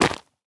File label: move